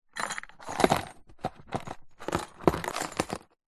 cardboard box small containing metal screws and other pieces movement tilt; zapsplat